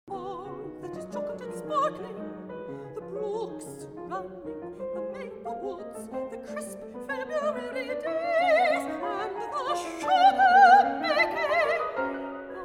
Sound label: piano